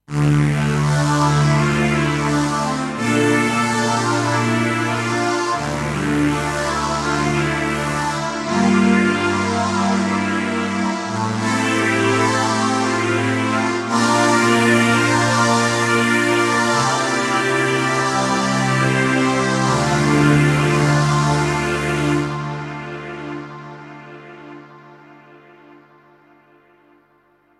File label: Vintage Stringer Phaser Chorus Delay Reverb